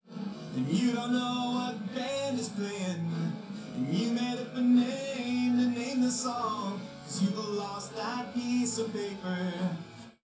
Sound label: drums; SiSEC11; underdetermined